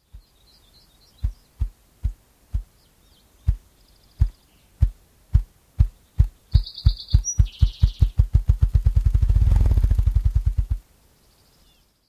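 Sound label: drum, Ruffed Grouse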